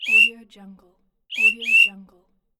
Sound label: the whistle